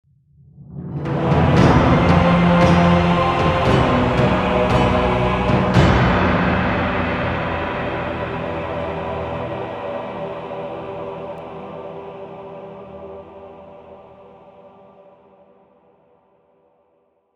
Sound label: lose